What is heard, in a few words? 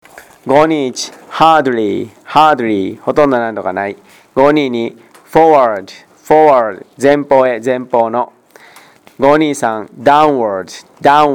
tango